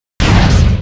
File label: gun